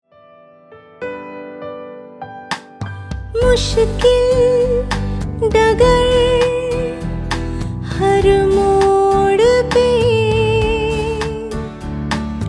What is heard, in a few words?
THANK YOU HINDI FRIEND TO FRIEND FEMALE